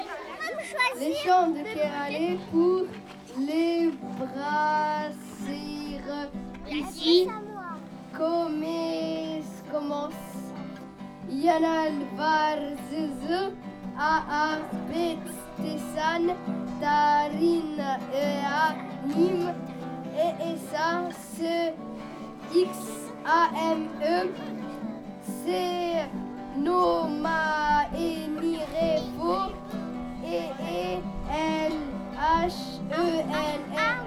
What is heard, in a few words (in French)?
impro enfant